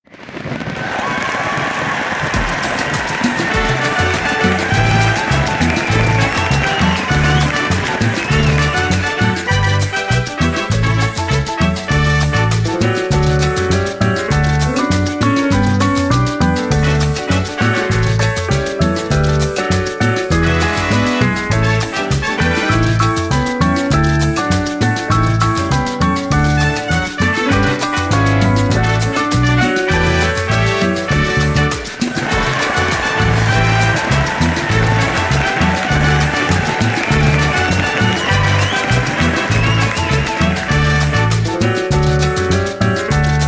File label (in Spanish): Feliz Cumpleannos cumbia pista